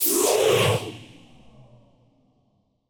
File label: Spaceship FX; Cymatics; Video Game Sample Pack Vol 1